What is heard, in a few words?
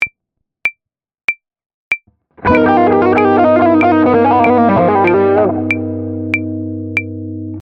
Lick; Guitar Club Aprile 2025